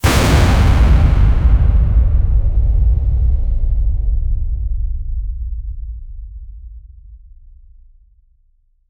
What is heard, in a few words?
radiant energy explosion, shimmering particles